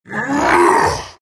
morph grunt; terrorblade

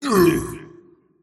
pain; tiny

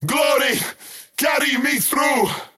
skywrath crown attack pain; skywrath mage

